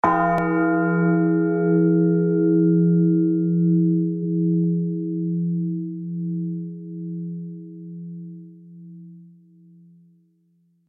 Death bell toll ring funeral grave; large bell

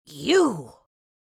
Witch fantasy vocalization short shout

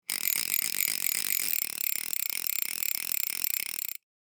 Bicycle freewheel spinning

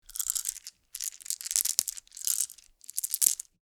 Game tokens moving clattering in hands

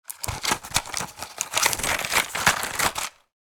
Pharmacy box shuffling medicine packages

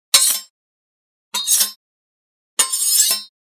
Knightly sword blade scrape

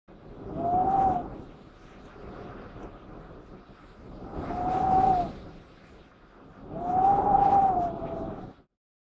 Wind howling and whistling through window

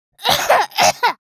Young girl coughing

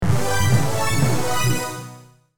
Slot machine casino multiplier win boost

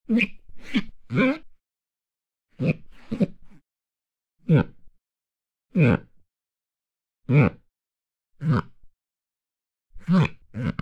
Rubber squeaks

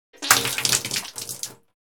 Human spitting spit water into sink

